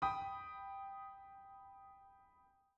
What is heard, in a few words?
G aug triad